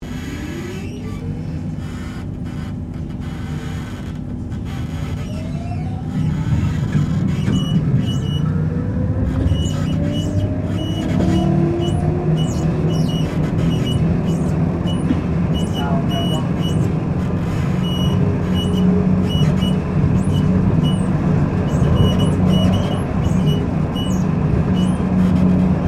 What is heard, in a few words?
Tram Melbourne C Class Interior Empty Short; Various Melbourne trams; Streetcars; Vehicles